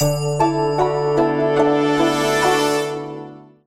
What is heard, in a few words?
Quest Complete Jingle; Avatar The Last Airbender The Burning Earth; ps2 wii xbox 360